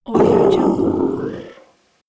demon death

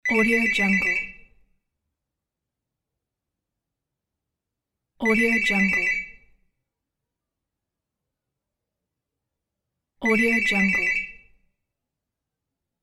telephone ringing sound

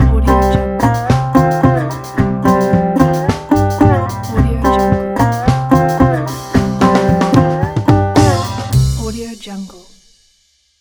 southern blues logo

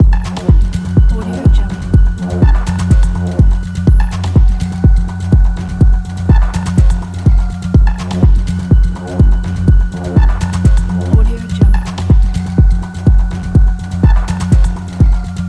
trance loop

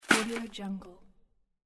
junk dropped; vintervarg

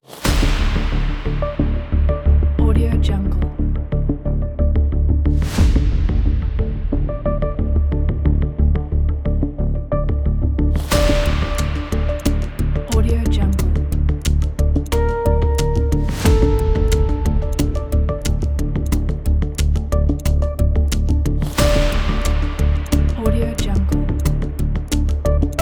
political intrigue

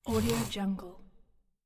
old sailboat hemp rope slide

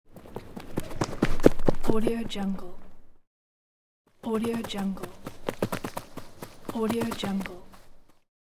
fast run

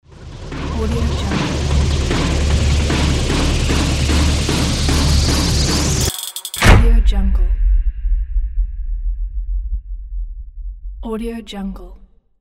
rising trailer impact

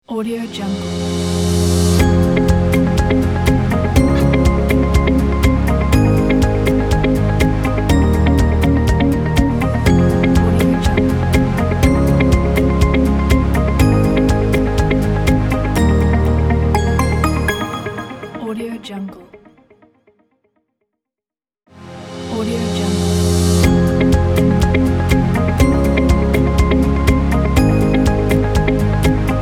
logo corporate

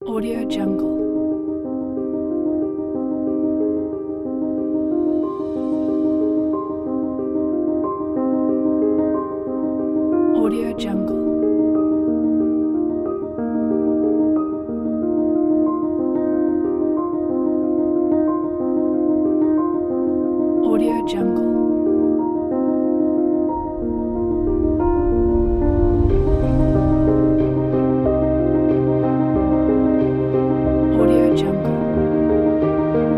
piano rain kit

